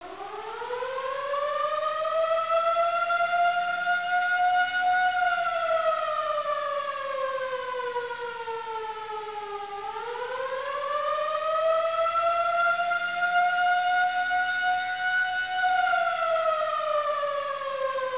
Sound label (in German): Zivilschutz Sirenensignal ALARM